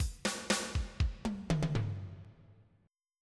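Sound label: rock break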